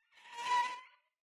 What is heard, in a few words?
Soft pterodactyl